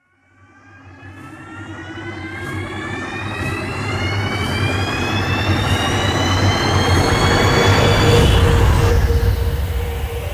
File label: Spinner, sound design trailer tools; Gothic Storm Music; Brain Warp (Sound Design Trailer Tools) 2011